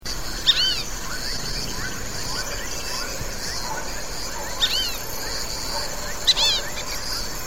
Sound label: Sirirí Colorado, Dendrocygna bicolor, Fulvous Whistling- Duck; cantos; ANSERIFORMES; Anatidae